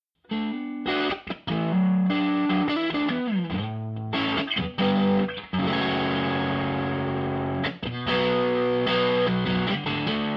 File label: overdriven